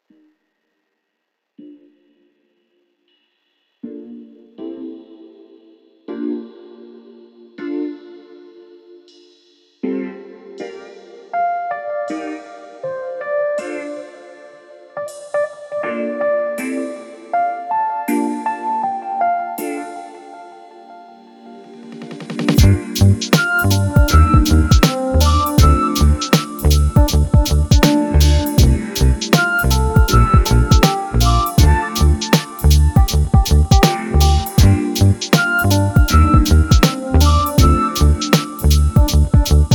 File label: lofi